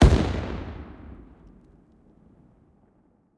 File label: plane; World of Tanks